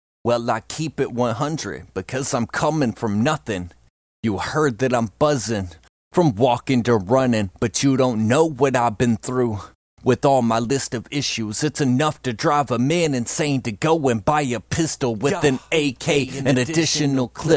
hip hop 128 G, Acapellas

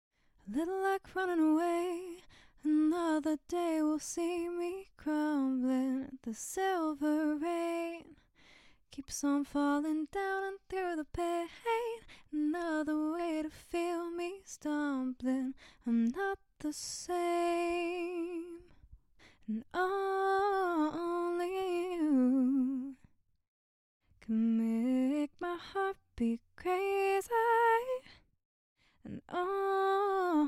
pop 100 Ebm

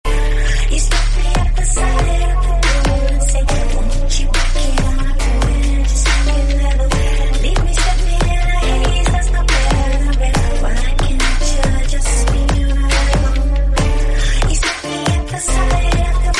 Remix

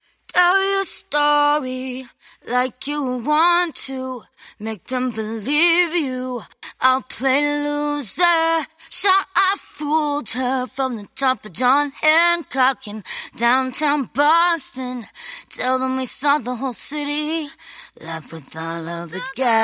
110BPM Key C, Acapellas

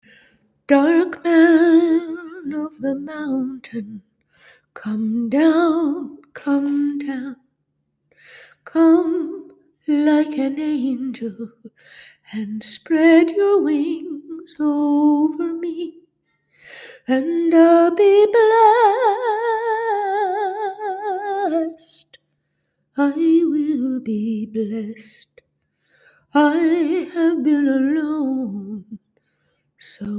folk 100 A, Acapellas

